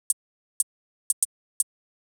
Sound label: 77 chh; hydrogen